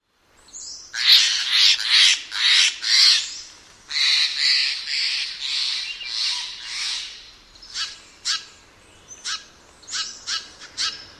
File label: family group